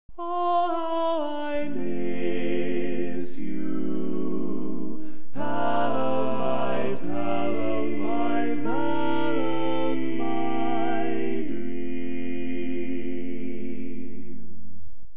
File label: Bass, Classic Tags